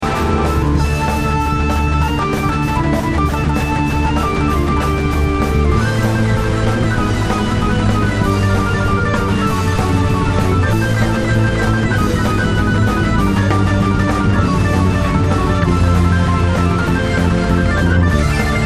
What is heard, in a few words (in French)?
solo clavier